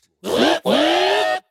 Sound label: Woop woop